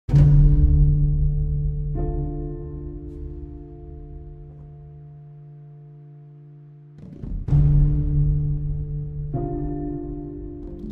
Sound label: Surface Ambiance; project scp roblox; Project Scp Ost